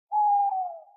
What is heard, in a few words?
owl